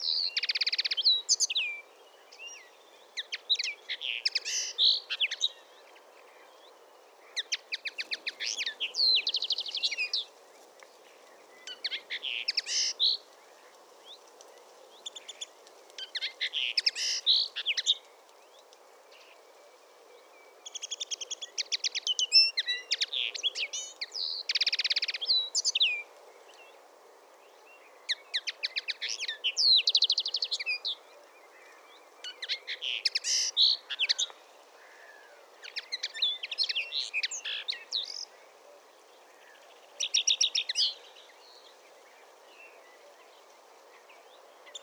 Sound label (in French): Linotte mélodieuse Linaria cannabina